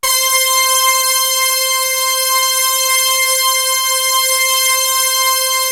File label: POPBRASS; MORPHEUS